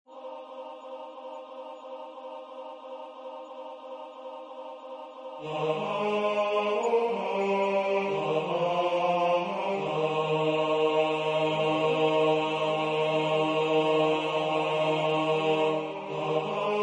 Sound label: tenor